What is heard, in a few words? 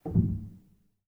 rel, Grand Piano; bitKlavier Grand; Piano Bar